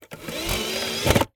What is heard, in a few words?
Insert, DVD Drive Internal; DVD Drives